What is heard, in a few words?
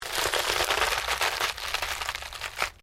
ORGANIC TWIST; Various Organic Foley sounds; Knives; Combat